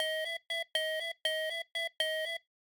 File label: remote control; Robot Boogie, Bharat's remote control